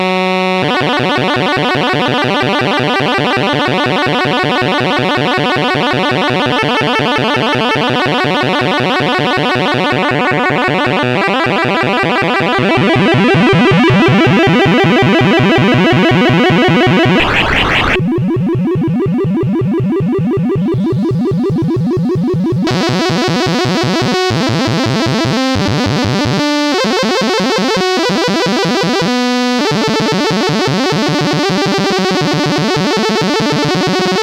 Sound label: SID arp